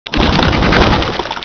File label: bite